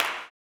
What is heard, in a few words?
CLAP, Blues Soul; Spectrasonics Vocal Planet